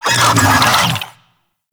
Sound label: Infested Big Ashen Vocal Attack Death; Warframe